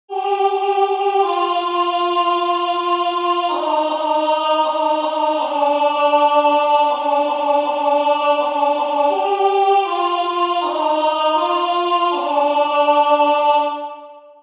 Alto

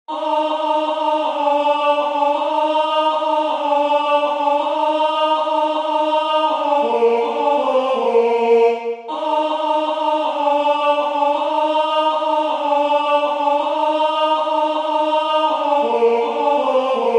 DRINK TO ME ONLY Alto

